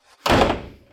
regular door slam; soundscrate

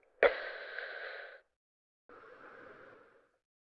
mask breathing; soundscrate

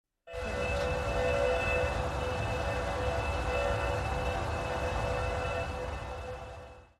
magical wall; soundscrate

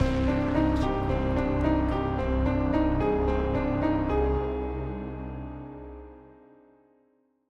Mystery Theme; soundscrate

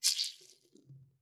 blood squish

